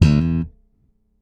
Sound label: Bass Hammer E, Electric Bass One Shots; Slate Digital BASSHOLE Sample Pack